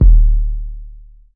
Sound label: 808 power kick, drum machines